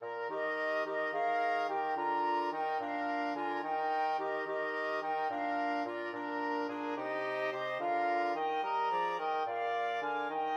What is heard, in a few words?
flexible mixed ensemble, 4 players